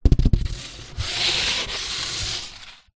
polystyrene expanded block down drag; foley

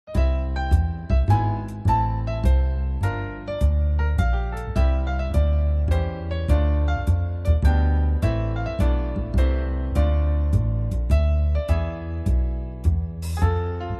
jazz

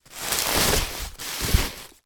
plastic packaging put into cardboard box; foley

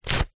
paper several sheets thick rip tear; foley

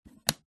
household alarm security wall panel flap close

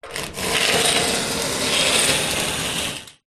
plastic small cap slide dusty dirty metal; foley

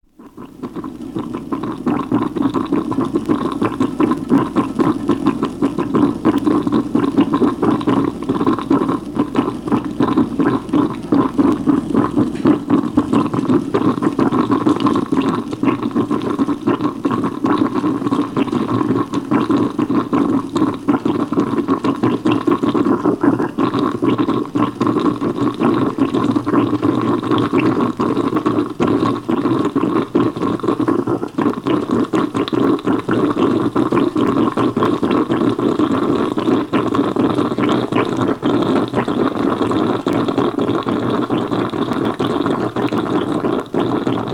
food drink coffee percolating; sound effects one

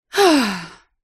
human sigh female 30 years old; zapsplat

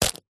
foley tissues small packet facial set down; zapsplat

